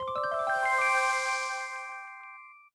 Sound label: Jingle Secret Reveal